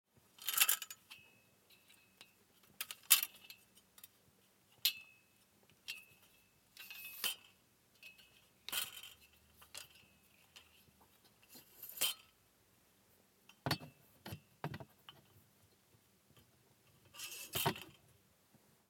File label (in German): Notenstaender zusammenklappen